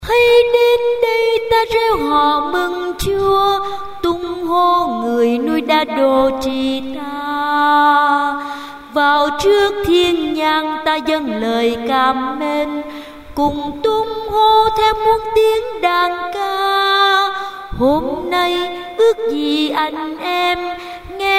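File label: soprano tenor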